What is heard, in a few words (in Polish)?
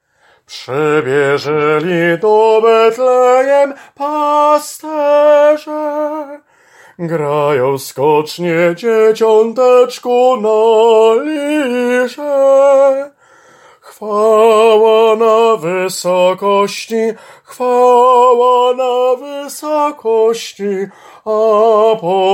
TENOR; Przybiezeli